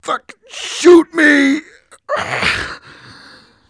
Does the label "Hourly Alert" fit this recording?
No